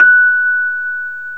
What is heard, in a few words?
Rhodes, F#; 3D Sound; 3D Keys VOL-1